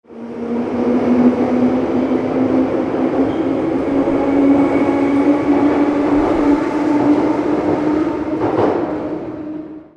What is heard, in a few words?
metro, transports